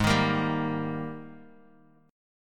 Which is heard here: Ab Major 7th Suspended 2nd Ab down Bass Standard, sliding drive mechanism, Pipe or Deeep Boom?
Ab Major 7th Suspended 2nd Ab down Bass Standard